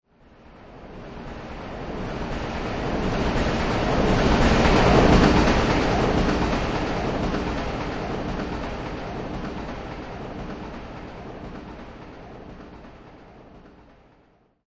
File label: Subway Pass By, Train driving by; Subway train / underground train: Pass by.; Vehicles